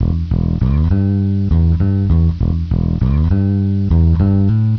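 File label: Riff 100 E, Bass; Sonic Foundry (Sony Creative Software); Crimson Blue and Fabulous Horncraft 4 RnB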